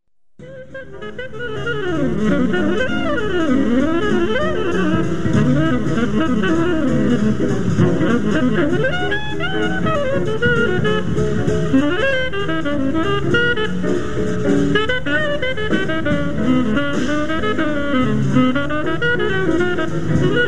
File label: COMBO Blues alto